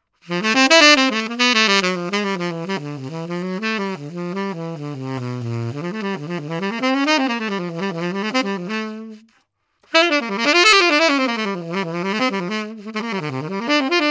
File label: Bebop; SYOS